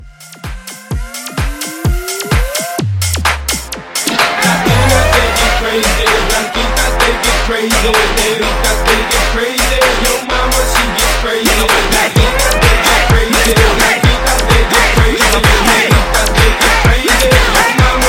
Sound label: Krazy House Mashup 128